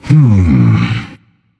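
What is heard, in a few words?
non verbal thinking, voice; cernunnos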